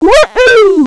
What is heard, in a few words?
woo